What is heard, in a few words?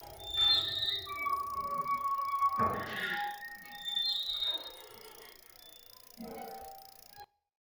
loop windmill moving